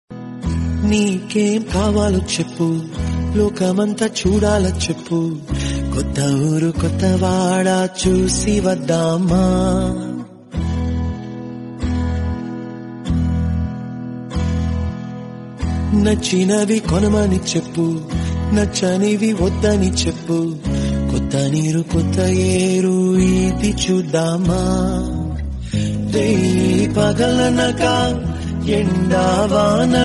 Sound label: flute cover instrumental; tamil